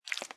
slime walk; blocks; Minecraft; Remastered

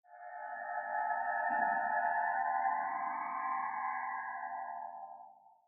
cave, ambient; minecraft

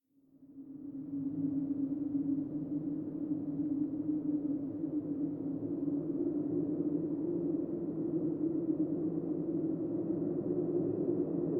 cave large, ambient underground

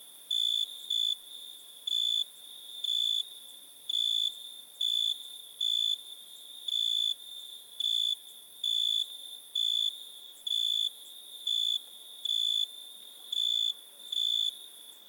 cricket night; ambient

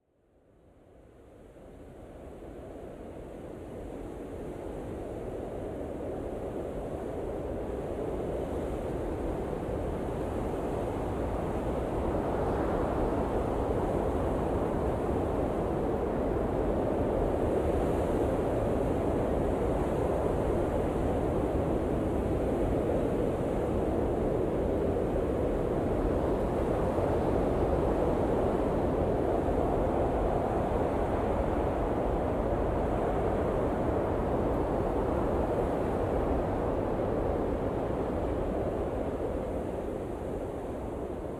snowstorm; ambient

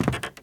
squeaky wood walk, step, bamboo; blocks

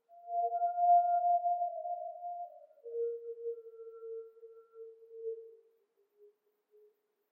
wolf

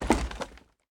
ladder, step; blocks

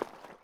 mud walk, step

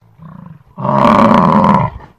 bison; ambient

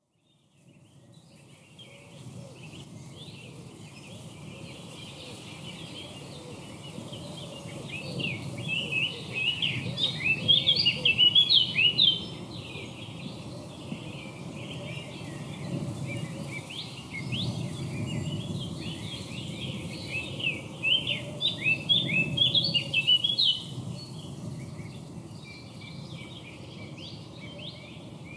bird wind, ambient

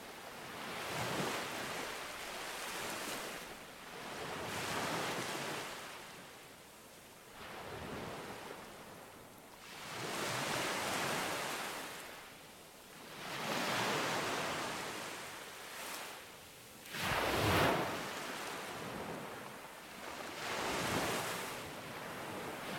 beach; ambient; water

